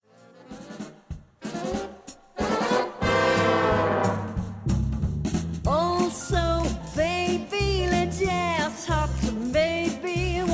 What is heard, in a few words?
Le Jazz Hot